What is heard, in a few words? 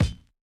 Bounce Sword